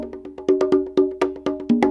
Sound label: PERC LOOP ETHNO 125